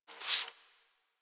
foley idle lick furr; Enemies; Warframe; Catbrow

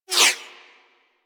Laser Whizzby; Warframe; Weapons

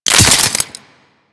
Head Shot Metal, Bullet Impacts; Warframe; Weapons

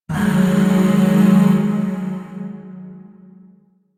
Shawzin Yo Chord E; Music; Chords; Warframe; Zariman Void Shawzin

